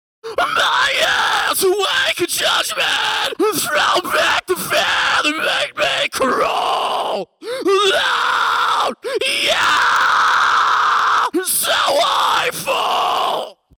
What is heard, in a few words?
scream with fx